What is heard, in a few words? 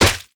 zombie attack